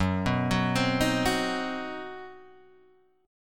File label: C Minor Major 7th Flat 5th Gb, chord